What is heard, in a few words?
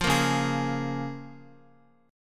Db 6th, Guitar; Db6 strummed; JGuitar